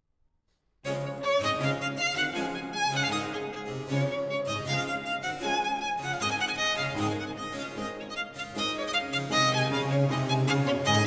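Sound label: Sonata for Violin and BC in D major, Allegro; RV 798; Antonio Vivaldi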